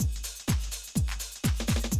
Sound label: 125, Classic Drum Loops